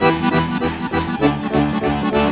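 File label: accordion groove; Best Service; World Colours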